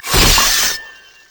dash strike; LoL; yasuo base

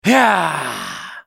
spellcast effort; BlindMonk

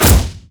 rumble basic attack

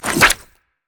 pick a card gold card; LoL; twistedfate

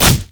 spider basic attack; Elise

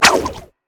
basic attack; LoL; twisted fate

